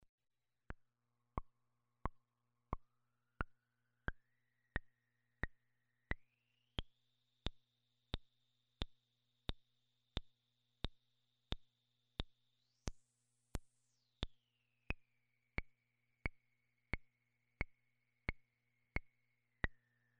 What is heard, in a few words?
percussive; Plague Bearer